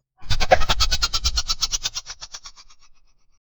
small alien creature

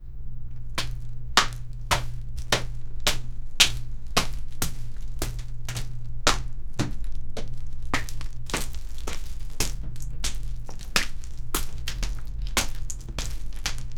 a man walking in lava; disaster

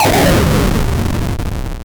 epic 8bit combat start

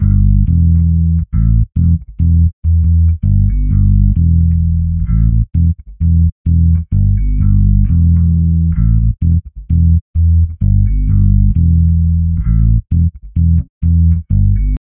reggae bass riff calm

